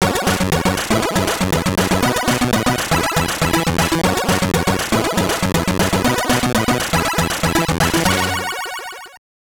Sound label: Big Jump; Supercross Freestyle 2000; GBC